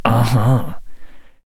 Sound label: Deadeye Attack Vocals